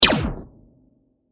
SciFi Impact; Digital; Impacts

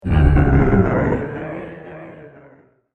Grumbling Zombie; Griswold The Grumbling Zombie; horror sound effect

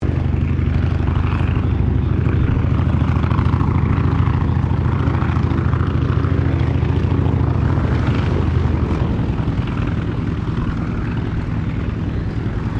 AIRCRAFT T-28 TROJAN PREFLIGHT, fighter bomber; Airplane Sound Effects; Airplanes (Sound Effects:Vehicles)

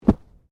Body movement Clothing Foley; Body movement foley to simulate falls and deaths; Dressing; Human

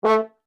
HORN A4 F STACCATO, French Horn in F; Alexander French Horn in F; Sound Effects:Musical; Foundation3

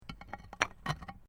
Stone Small Move

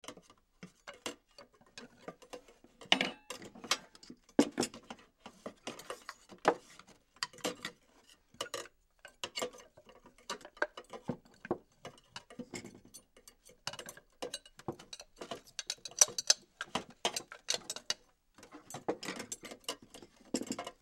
Utensils Food, Utensils Food Movement; Dishware; Blastwave; Household

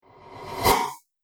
Whoosh Builds Up To Fast; Swish, Sweep And Swoop; Andrew Fleming

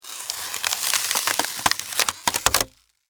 Texture, Crunch, Crush, Styrofoam; Objects

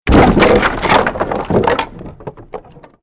created wooden structure crash medium

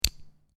Lego Two Lego Pieces Clack Together; Miscellaneous Toy Sound Effects; Lynne Music

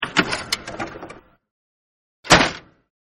Copier Copying Document-Loop; Miscellaneous Office Sound Effects

